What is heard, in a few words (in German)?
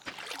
fluss; Stardew Valley